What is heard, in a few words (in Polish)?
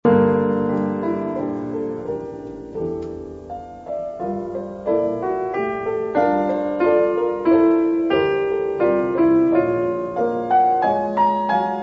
Koncert na 2 fortepiany Corale